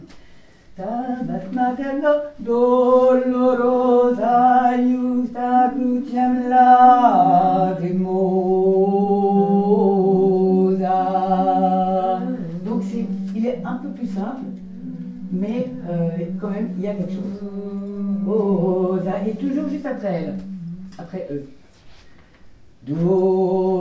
BASSE bis; Stabat Mater

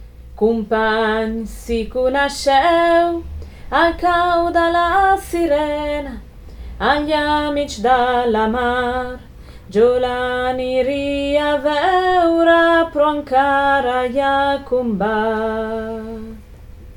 couplet bas

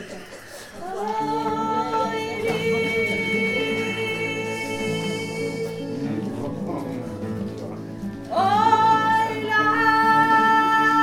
haut; Mo ve la bella mia; Sarrazineurs

